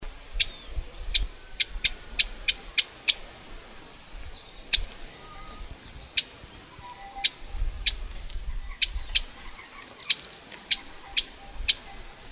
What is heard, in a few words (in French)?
fauvette passerinette